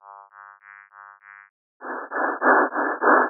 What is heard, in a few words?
bright loud same